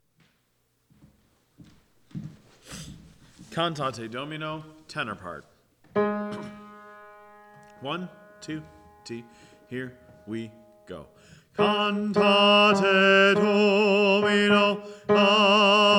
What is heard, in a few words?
Tenor, choir; Cantate Domino; Pittoni